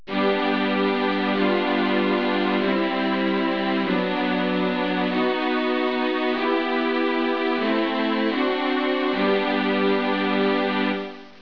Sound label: strings track